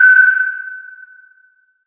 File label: sg cloak sonar, sentry; buildable